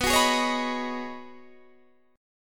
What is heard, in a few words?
B 6th Add 9th B Guitar DADGAD, chord